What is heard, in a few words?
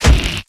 Melee Magical Medium; Kilta